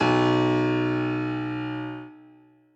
bass piano

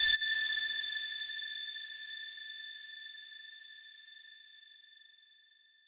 lead3

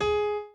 piano chord

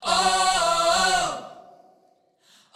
Key chant; luxembourg zwee

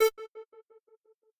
synth

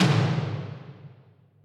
perc

